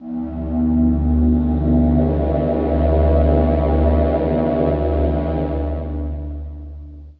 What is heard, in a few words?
beatnik, Synths; Notes